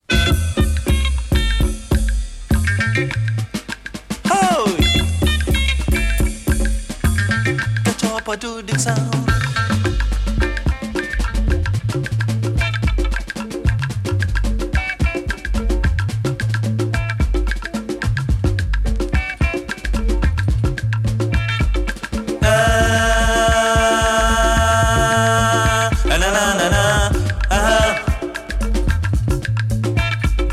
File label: Jungle Beat